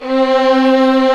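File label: strings, 8pc; String Master Series; Vlns 2-5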